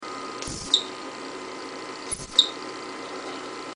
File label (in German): Pfeiffton